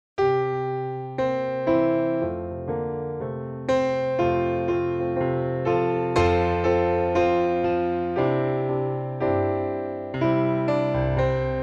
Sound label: instrumental